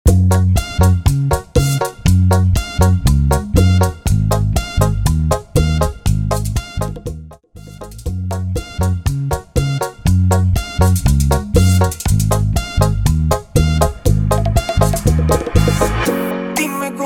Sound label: Bachata Project Cover Version 120 BPM